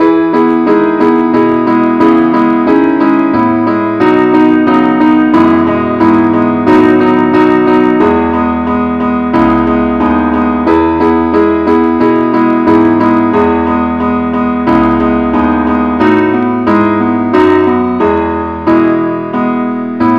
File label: keyboard only key E